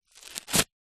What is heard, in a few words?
human wax strip rip peel skin remove hair